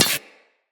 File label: Laser Impact Metal